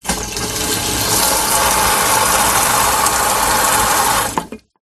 industrial cannister large plastic water spray inside from hose short burst